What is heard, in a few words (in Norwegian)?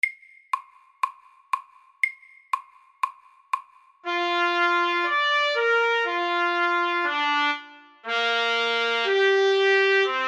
Vocalise FORT